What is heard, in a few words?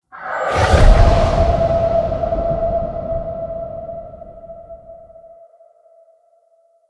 Wolf Howl Cast; Orengall